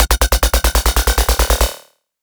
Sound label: Bass F# Stabbing, Dubstep; Bass One Shots; Ghosthack; Dubstep Sound Arsenal; 50 Bass One Shots